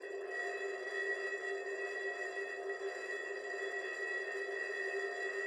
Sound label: tin can conv whitened bubbling